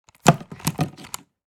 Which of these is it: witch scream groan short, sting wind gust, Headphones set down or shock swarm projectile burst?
Headphones set down